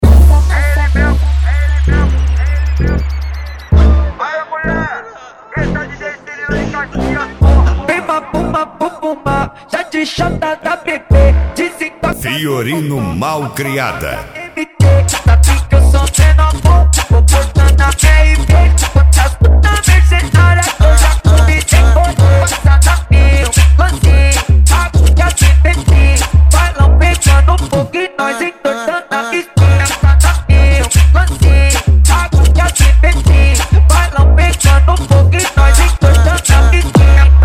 mega funk bass

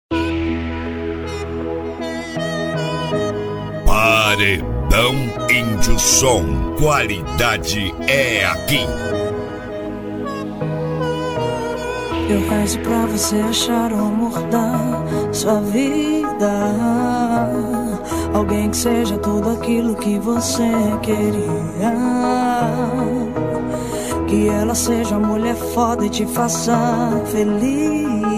reggae; reggae torre eifel; paredao indio som vol 09

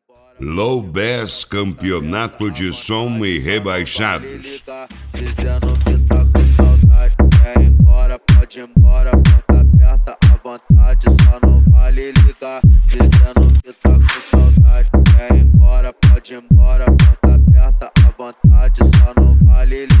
low bass

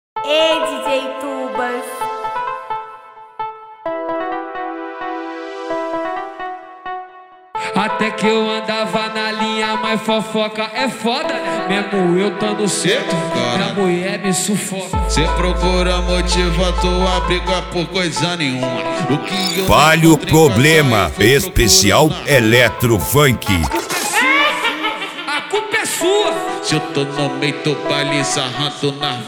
eletro funk

